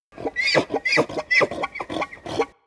Elk Vocal; Animals; XBOX; BassProShopsTrophyHunter2007

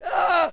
pre fall, Rider

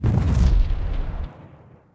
distant explo; impacts explos

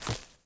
med creature fs dirt, footsteps

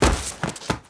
bodyfall stone, foley

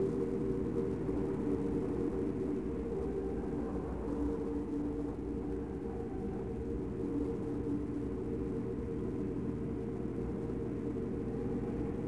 Tatooine wind, ambience; Star Wars Obi Wan; XBOX

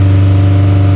CIVIC rpm, Engine; Cars; XBOX

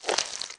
Mutant Slither; Valleakan Mutant 1; Aliens

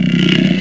engine vox; Quantum Redshift; XBOX; leah

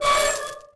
houses swing; Tony Hawk's Project 8; XBOX

